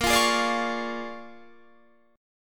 A sharp 7th Sharp 9th A sharp down Guitar Half Step Down; A#7#9